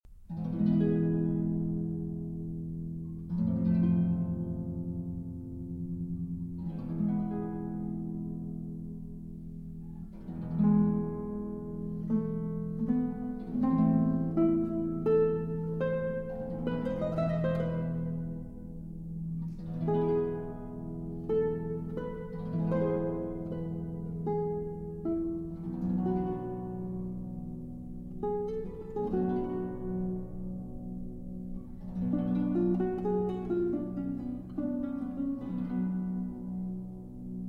Sonata For Harp & Guitar; Hovhaness; Op. 374 Spirit of Trees

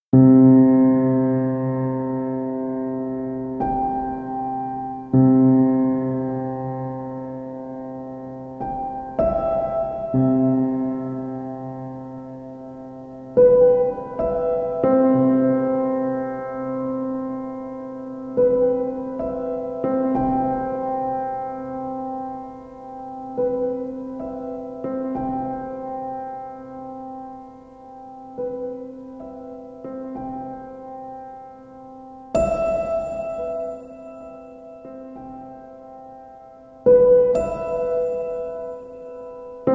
Piano Sounds

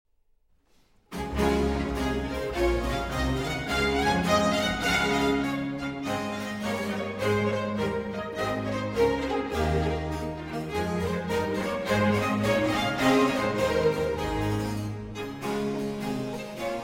Suite in D Major For Strings VI. Gigue

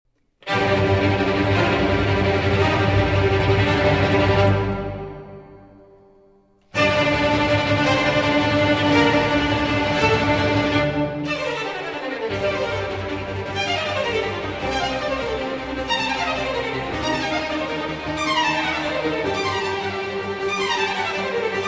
Violin Concerto in G Minor; Vivaldi; The Four Seasons; Summer; III. Presto

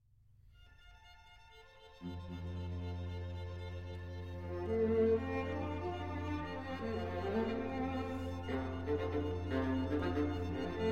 String Quartet in F Major; String Quartet No. 12; Op. 96 - American B. 179; Dvoark

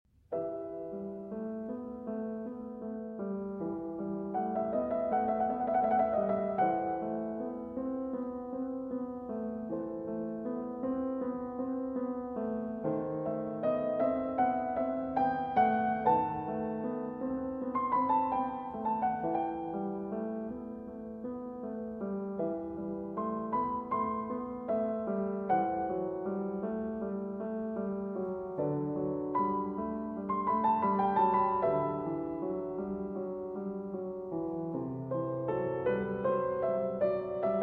Prelude in E Minor; Prelude & Fugue in E Minor, BWV 855

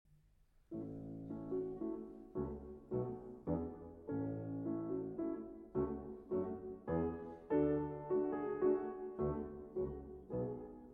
Symphony in D Major, Larghetto, Live; Prokofiev; Arr. Terashima; Symphony No. 1; Op. 25 Classical II.

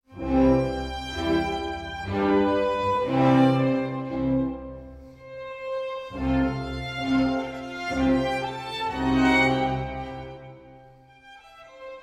Concerto For 2 Hurdy-Gurd

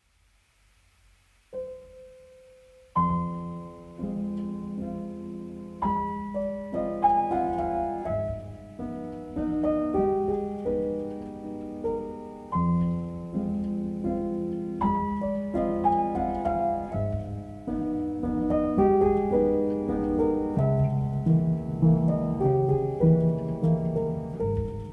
Piano Version

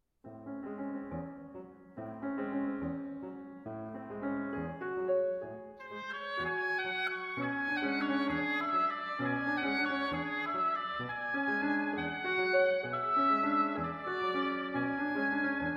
Impromptu for Oboe & Piano

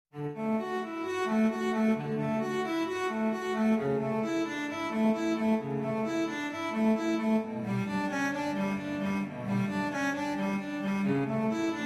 Cello Suite No. 1 in G Major, Prelude

